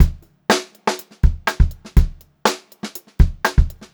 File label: 122 funky beat, funk groove drumloops; USB Soundscan vol.38; AKAI